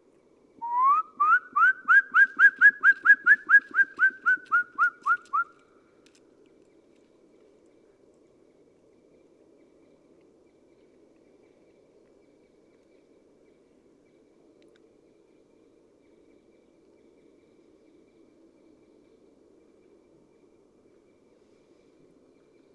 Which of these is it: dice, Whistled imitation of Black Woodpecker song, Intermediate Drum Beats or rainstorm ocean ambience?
Whistled imitation of Black Woodpecker song